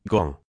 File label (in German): Gong